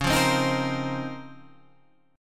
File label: Db Major 13th, Guitar Half Step Down